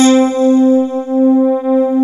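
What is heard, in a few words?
digital domain, Korg M-1; Rubber Chicken Software Sample Bank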